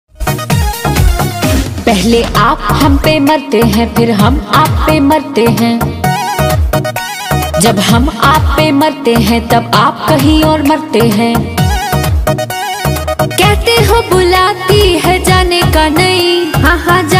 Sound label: bhojpuri ringtone